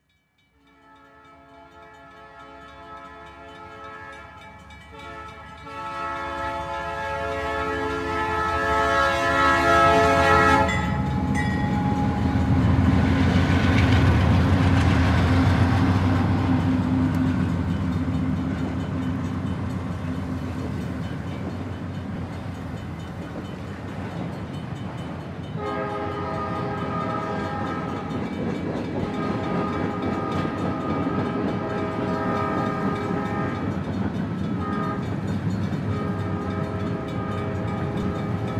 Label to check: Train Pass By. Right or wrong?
Right